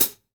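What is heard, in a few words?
JV hi hat, JV-1080 drums; EdgeSounds; Drum Mashines VOL-1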